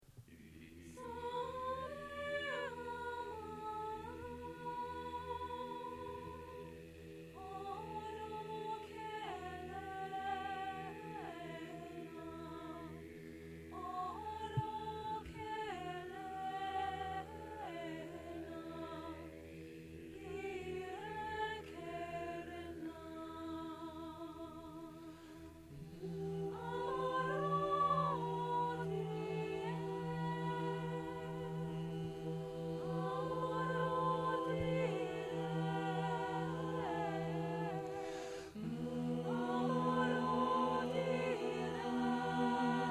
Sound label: Ederlezi Tenor; training recordings